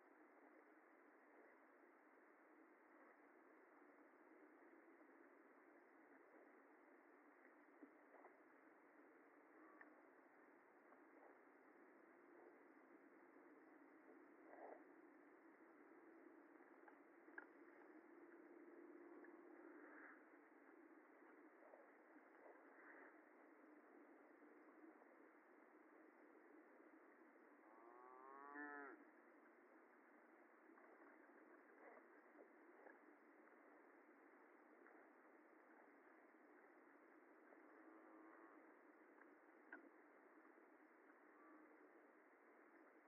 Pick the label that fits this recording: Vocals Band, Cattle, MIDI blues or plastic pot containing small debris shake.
Cattle